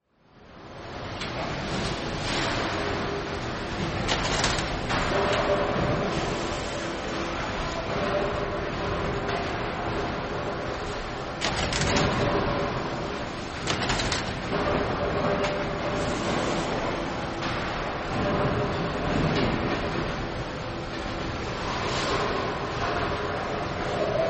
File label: brewery plant keg room ambience